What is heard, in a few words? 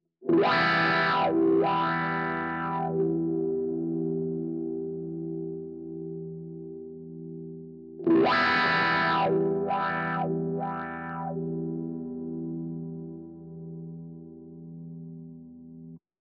Wah Fill